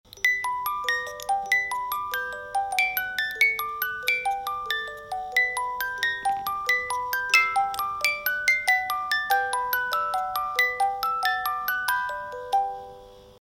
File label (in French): boite a musique; memoiresdoceans; jeux et jouets; plongeon sous la mer